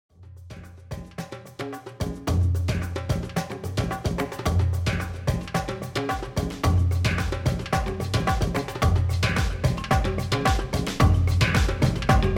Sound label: only Drums; justement Drums (1:26)